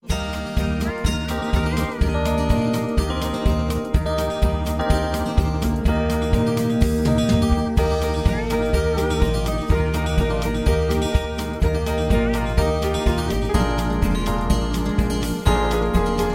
High Key

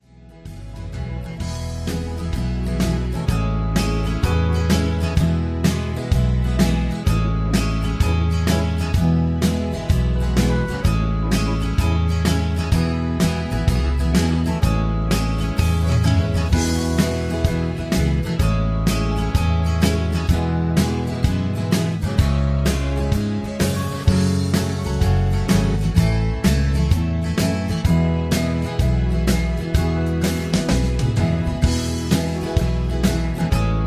instrumental no melody

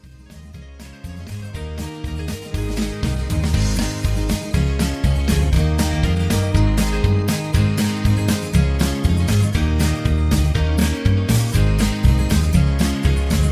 Instrumental Higher Key

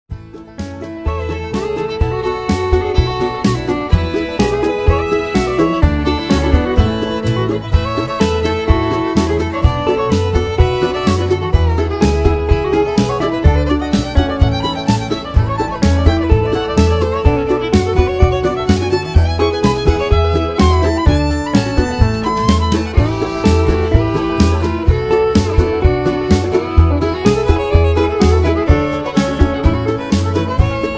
Key of A

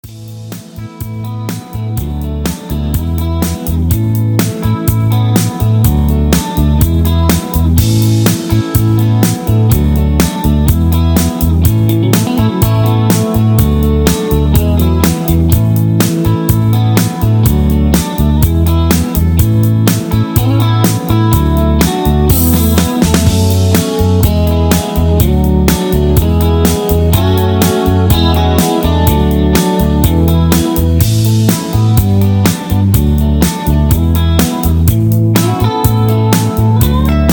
Patter

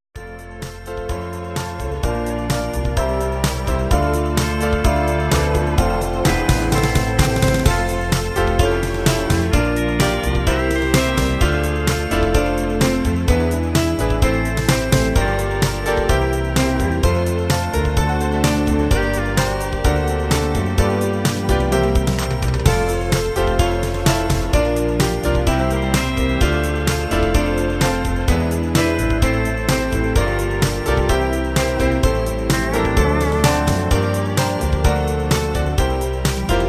Instrumental

